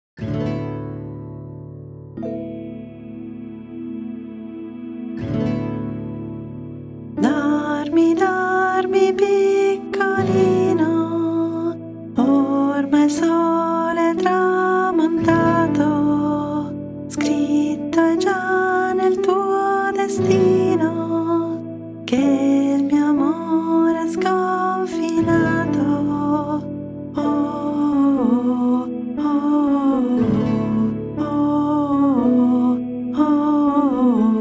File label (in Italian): Dormi Dormi Piccolino; Marrone Scoiattolo